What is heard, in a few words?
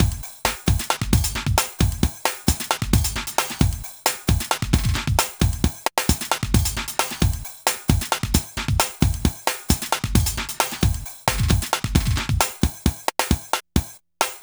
Drum, Breakbeat